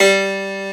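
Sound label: PIANO ROOM G2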